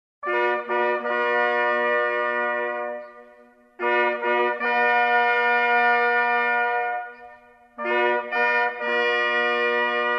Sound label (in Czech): lovu zdar, troubeni